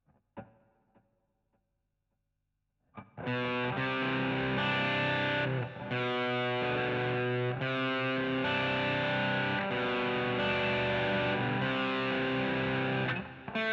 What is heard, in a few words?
GTR A Solo